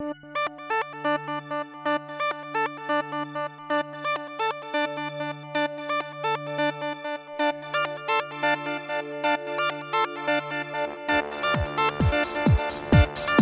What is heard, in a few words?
Space live techno ringtone